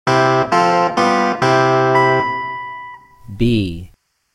B4 Clavinet Major; ear training instrumental color

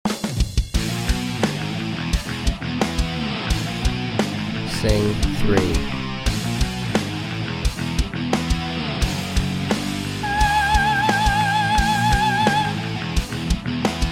Soprano Key of E; real heavy metal ear training

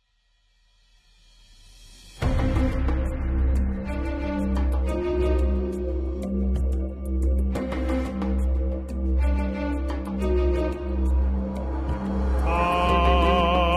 Key of C One Note; ET Long; movie score ear training

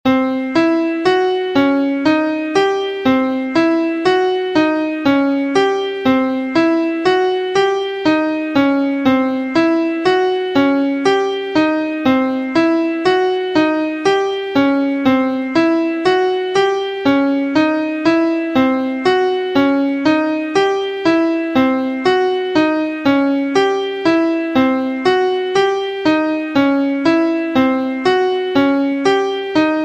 Degree 1 b2 b3 3 b5 5 Closed Position Key C; harmonic and melodic equivalence; trichord pair

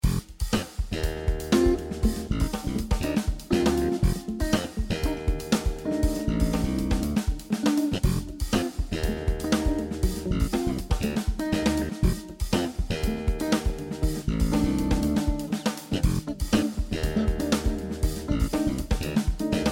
F Mixolydian Jam Track; harmonic major modes; jam tracks volume four

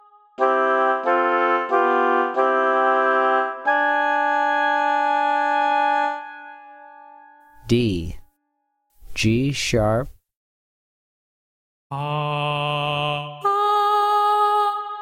D3 G#4 Oboe 2 Note Singing; Level Two “Singing” Example; ear training two note

